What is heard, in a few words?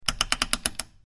Rotary Dial Turns, switch clicks